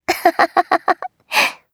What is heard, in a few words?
laugh, JPN; Eternal Return; Katja